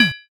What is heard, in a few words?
plate set